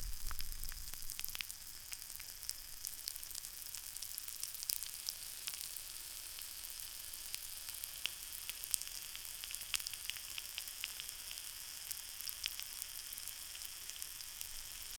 bacon sizzling on pan; breakfast

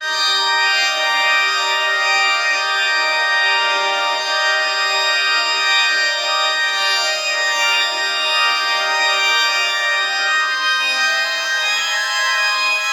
1930s soap opera organ

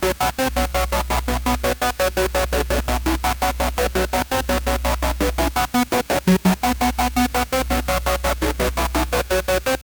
music from computer or ma, robotic

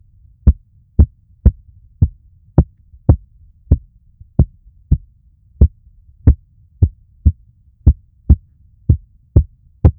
basketball low dribble

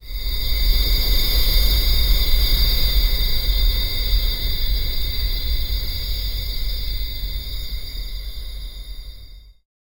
cinematic ambience with m

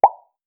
cute dating app notificat

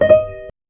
charging confirmed a sof, electric piano

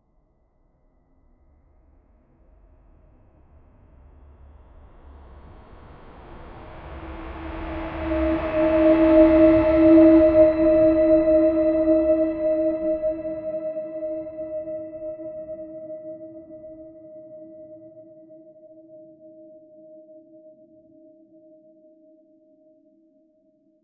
deep resonant shankh sound, temple

